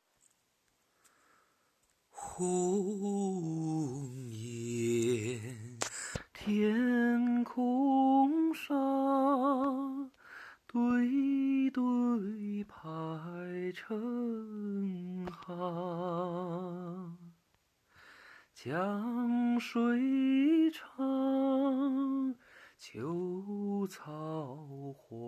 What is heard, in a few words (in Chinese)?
清唱